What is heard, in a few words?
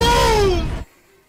boom